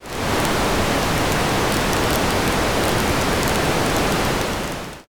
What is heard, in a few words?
fire